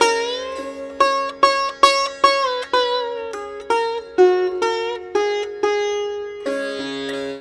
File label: SITAR LINE, SITAR MELODY; Best Service; World Colours